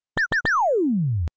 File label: Pew pew pew, ringtones